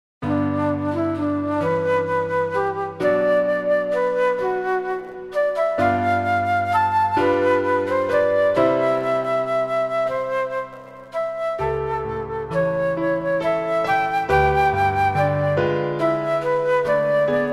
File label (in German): Romanze Floete Klavier D-Dur Flute Piano; T130; Reger